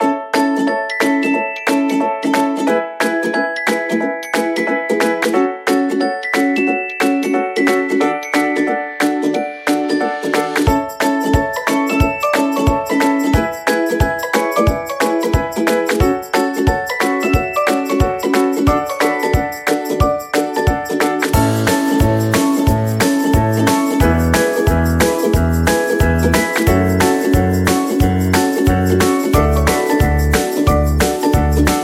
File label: music band background